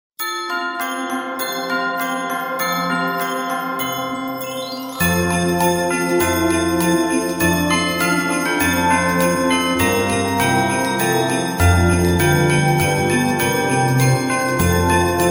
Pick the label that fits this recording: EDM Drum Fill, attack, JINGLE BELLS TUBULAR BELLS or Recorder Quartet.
JINGLE BELLS TUBULAR BELLS